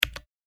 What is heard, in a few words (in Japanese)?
タイピング メカニカル 単